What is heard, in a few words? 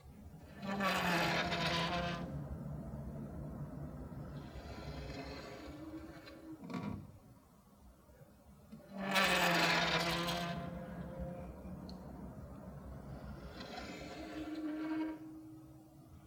Window Open And Close